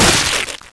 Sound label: spider bite